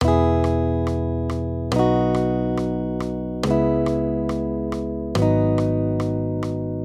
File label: maj triads; accompaniment